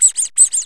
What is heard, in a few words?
squeaky